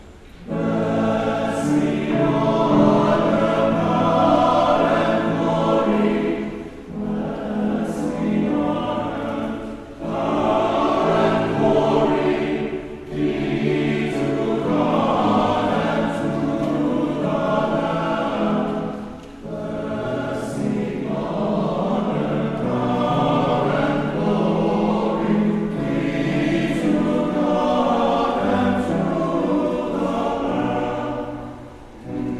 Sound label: Choral